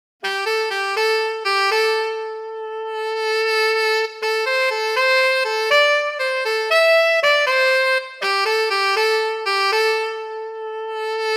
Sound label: Logic ProX Studio Bariton Sax